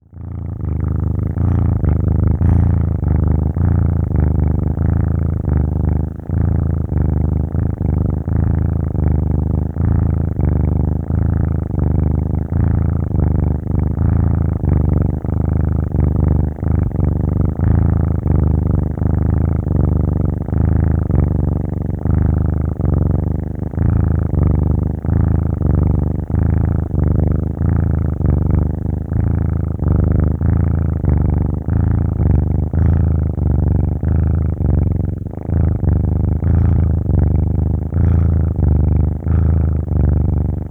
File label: Kitten Purring; افکت صدا حیوانات; Animals